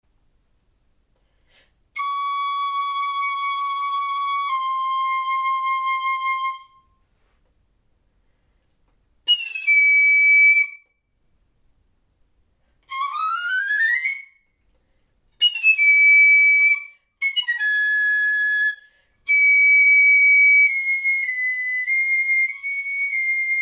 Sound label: piccolo